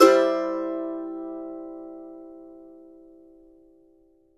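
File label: MANDO MAJ C major up, Left; Sampleheads; Whole Lotta Country 1 & 2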